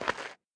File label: dirt footstep; adams venture origins